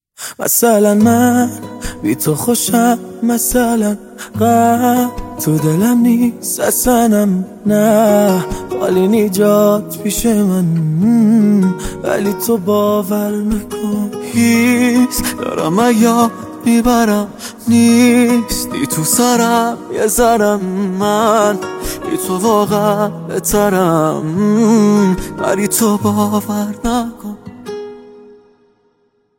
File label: Akord